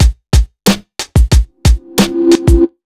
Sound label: BEAT R, Pure Electro; USB Soundscan vol.03; AKAI